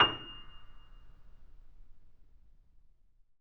PP F 0, Grand Piano off mic; AKAI S6000 CD-ROM - Volume 2

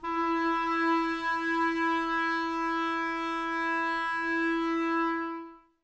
Clarinets, Wood Winds

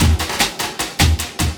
LOOP, 150 BPM, Drum & Bass; Ueberschall; Drum & Bass Resonance

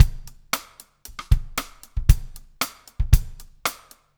STIK

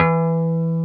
Jazz Guitar; 90's Sample CDs; Club-50 - Foundations Roland; Jaz-Emperor

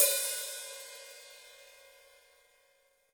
HiHat & Crash, Roland TD-20; HiHats

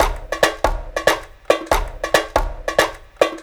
Addon Loop, Drum Loops 140bpm, Perc Loops; Zero-G Trance Inducer; 90 s Sample CDs

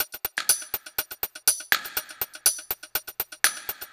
122 Old Skool Jam Hats, Drum Loops; 122bpm; Nu Dance Electro

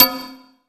HAMMER BL, JV-1080

